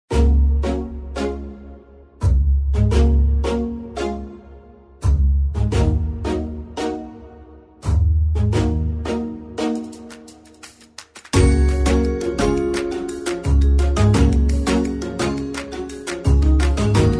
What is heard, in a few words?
corporate